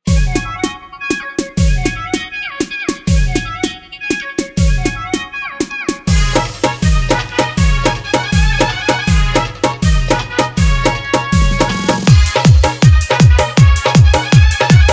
Full Dhollki Bass Dance Mix

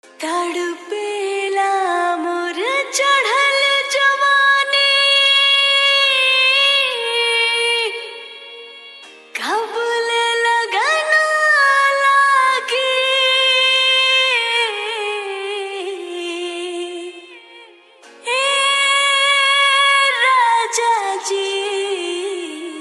Bhojpuri Hot Full Dhollki Bass Rod Show Dance Mix

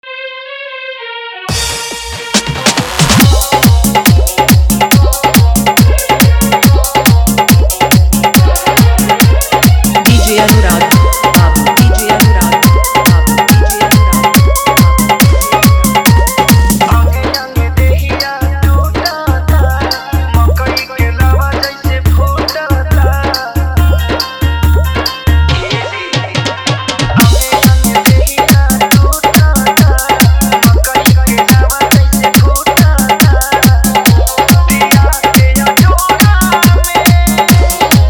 Hard Dhollki Bass Remix

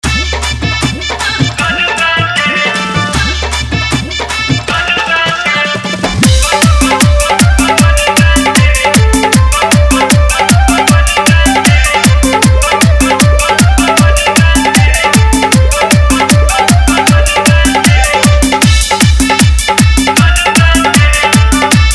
Desi Dhollki Bass Garda Dance Mix

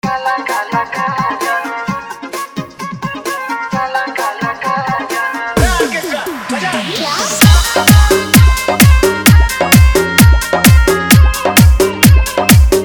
Hard Faadu Dholki Mix

